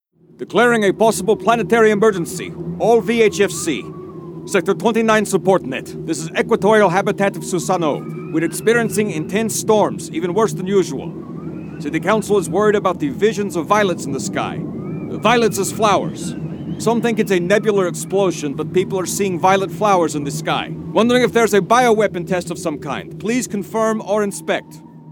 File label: Distress Signal